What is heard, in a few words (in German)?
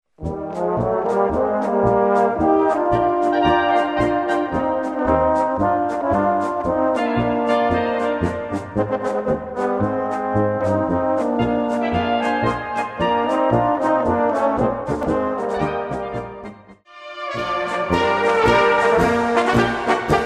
Polka; Willi Polka